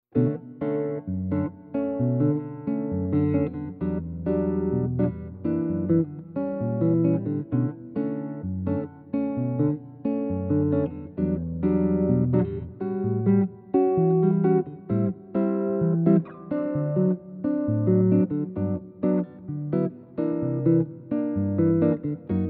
Bossa Comp, jazz tunes

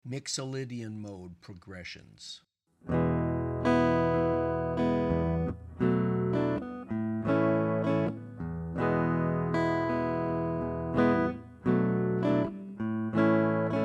Modal Chord Progs Mixolydian; lead skills materials

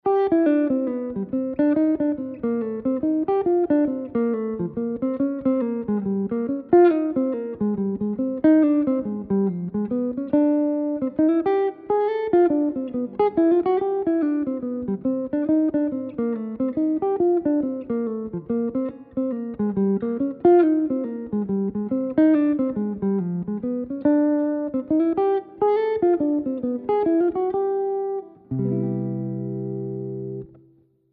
solo etude; jazz tunes

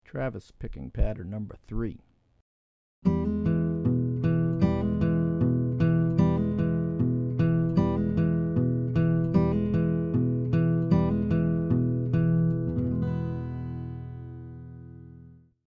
Travis Patterns; travis style lesson materials

